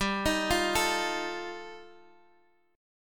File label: G 7th Suspended 2nd Sharp 5th, G, chord; down